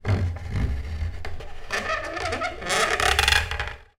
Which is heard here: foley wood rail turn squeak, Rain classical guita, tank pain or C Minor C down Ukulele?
foley wood rail turn squeak